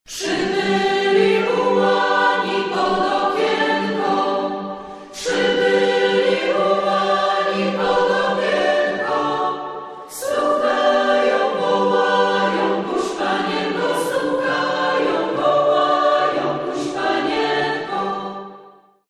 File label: acapp